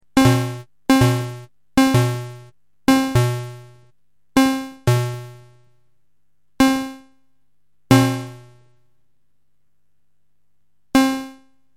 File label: vclfo tracking, synth